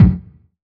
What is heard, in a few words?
909 BD amp; Goldbaby Tape808 & Tape909; The Tape 909